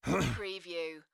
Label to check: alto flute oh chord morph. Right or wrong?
Wrong